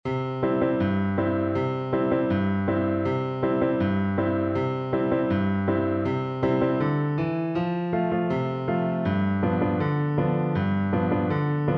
wabash, Viola

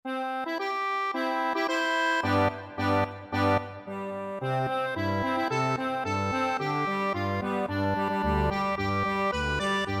accordion

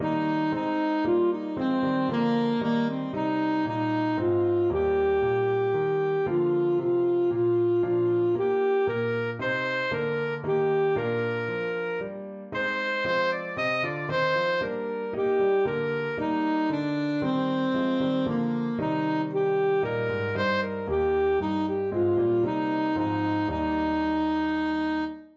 ASAX, saxophone

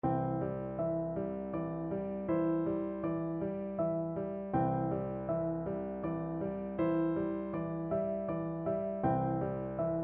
piano, piano duet

